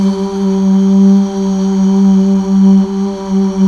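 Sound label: Male Uuh; Best Service Gigapack 1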